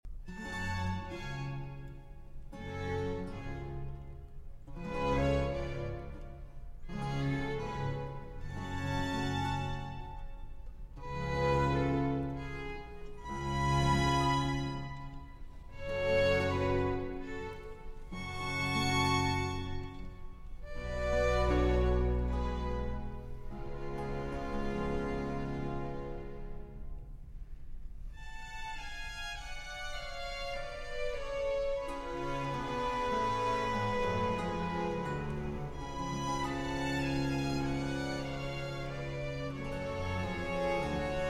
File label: Concerto For Violin And Strings In G Minor, Allegro; Vivaldi; Op. 8, No. 2, RV 315 - 1. Allegro non molto